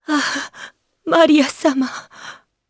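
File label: Vox Dead Japanese; Morrah; kings raid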